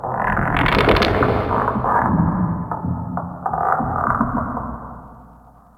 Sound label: Tentacle Creak; Warframe; Orokin